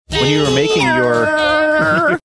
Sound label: making modem like sound